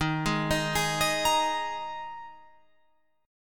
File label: Eb Suspended 4th, Guitar, Open F; Ebsus4; arpeggiated